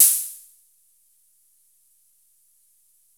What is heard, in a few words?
HATSSSSS; Music Store Sound Service; Einstein